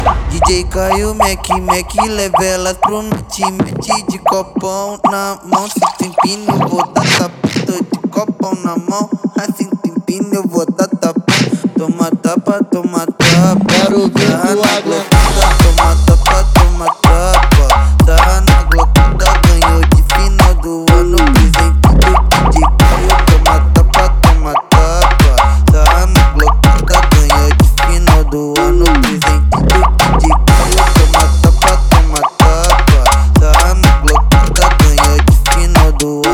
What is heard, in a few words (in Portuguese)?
funk mega funk